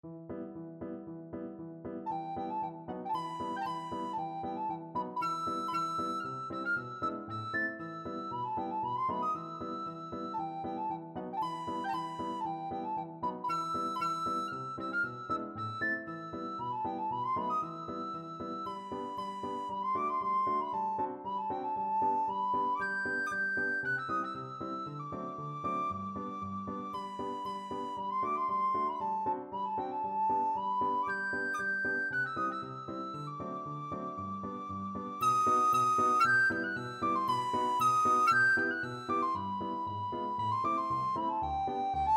schubert moment musical, recorder

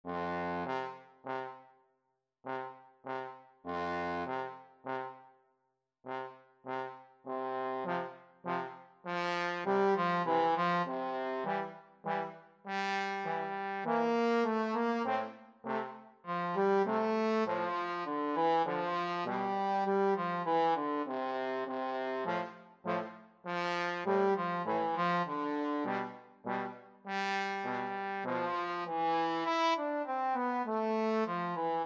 elephant trombone duet

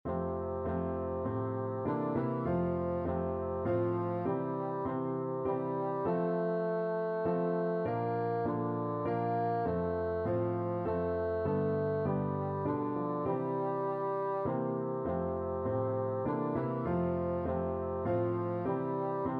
god glory, bassoon

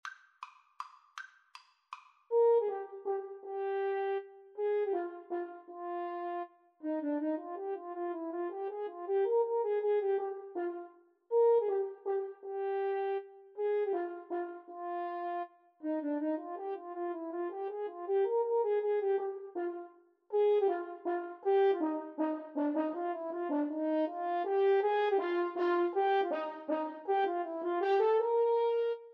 duos menuett, French Horn Duet

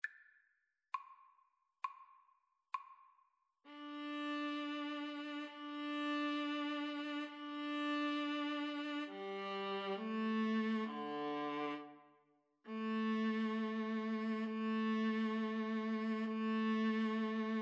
Viola Duet

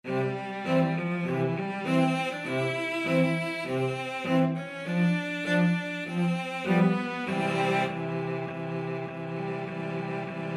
cello quartet

